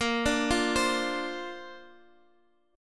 Bb Major Add 9th, Bb, down, Guitar, Half Step Down